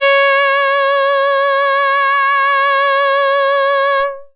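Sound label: Box Cello DX100 C sharp 5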